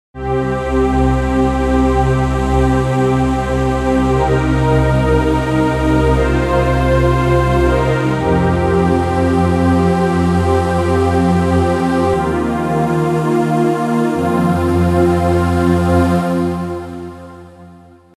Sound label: Embracer Contact